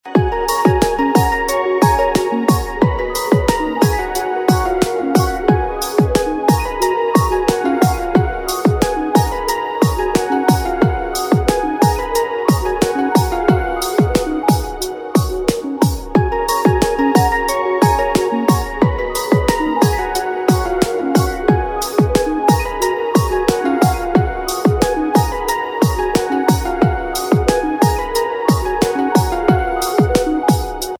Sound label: Instrumental Ringtone; Bollywood Hits (2014)